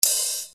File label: Hat, EuroDance